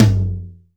TOM, Drums; Best Service; Real Mega Drums VOL-1

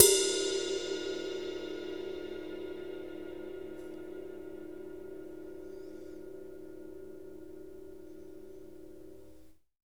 RIDE20HV B; Big Fish Audio; Ross Garfield Drums VOL-2; CYMBALS

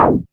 BLAST, ZAPS; Back In Time Records; Big Bang

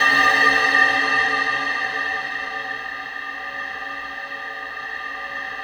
STEEL SPIR; Distored Reality

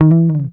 GTR TRIK, Jazzistic; Univers Sons

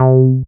SYN TB Orig; Best Service Gigapack 1; SYNTB303

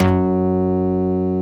SMACK BASS

